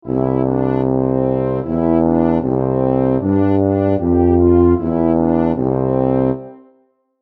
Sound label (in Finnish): basso